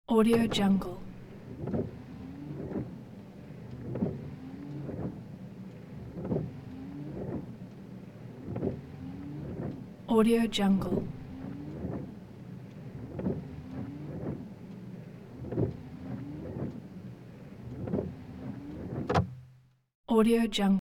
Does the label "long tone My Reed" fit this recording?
No